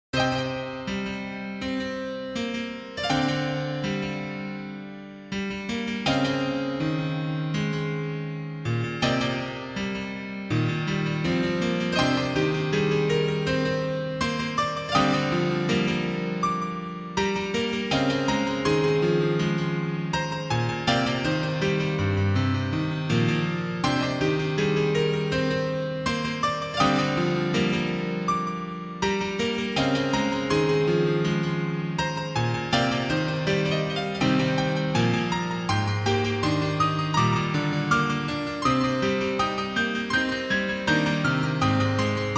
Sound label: piano; soleil-musique; Fluid R3 GM